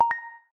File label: Silent mode off; Samsung Galaxy Watch 8